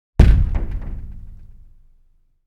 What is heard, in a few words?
Glass Boom; SFX Producer